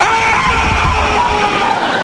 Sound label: funny scream aaaaaaaaaaaaaaah